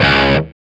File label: D 3, Soldano rock guitar; Spectrasonics; Hans Zimmer Guitars VOL-1